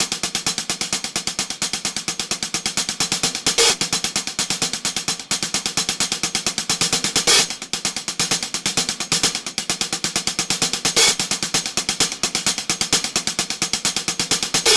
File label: 130 highhat; shadows